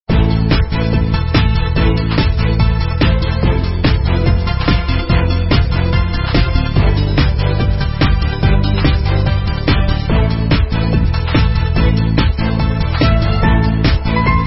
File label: background